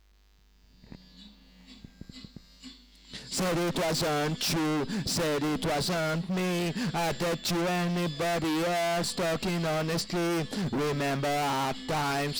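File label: Vocal Part 1 full backing vox on choruses II; REMIX KIT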